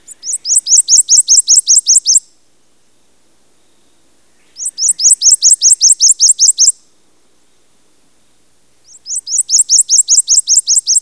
prothonotary warbler, Bird Calls; Ornithology

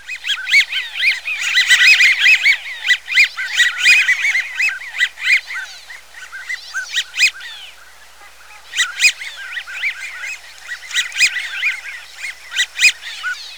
wigeon, Bird Calls; Ornithology

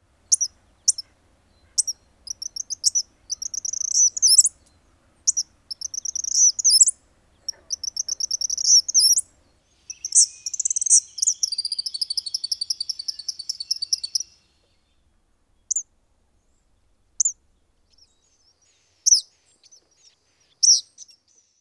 kingbird; Bird Calls; Ornithology

